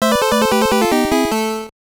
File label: Stage Clear; demon sword; nes